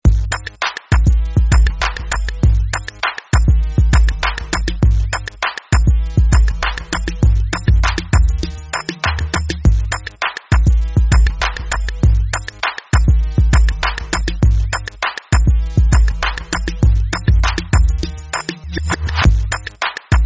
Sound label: Hip Electro